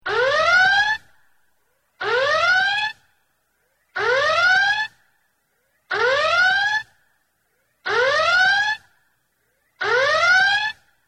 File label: Red Alert Klaxon; Star Trek